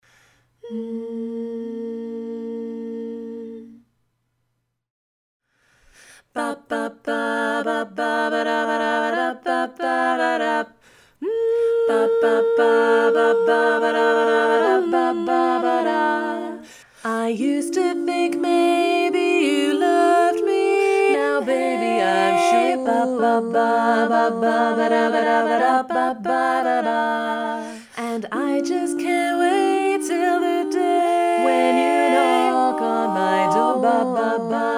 Tenor muted, harmony

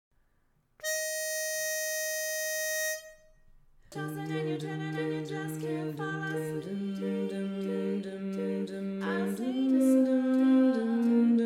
Bass; Verse 2, Chorus 2

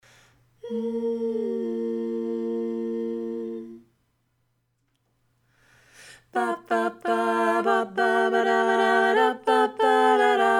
Baritone muted

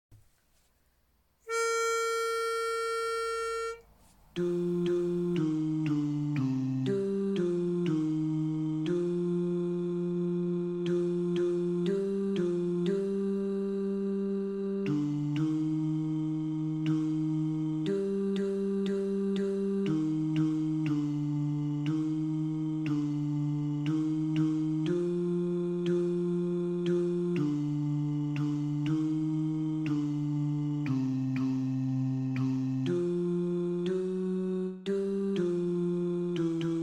Bari w pitch, harmony

